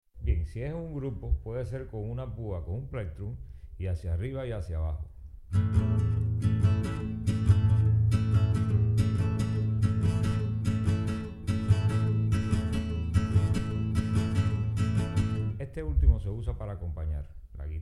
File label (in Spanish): rayado grupo